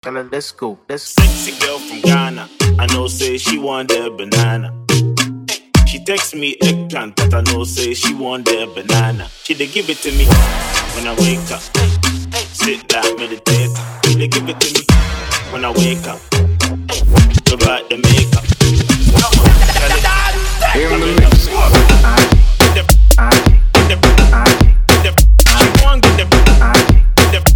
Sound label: Club Mix Ambiance